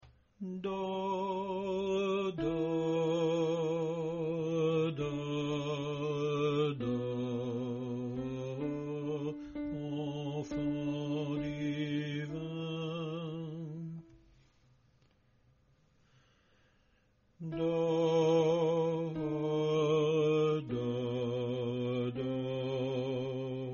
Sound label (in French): alto; entre boeuf et ane gris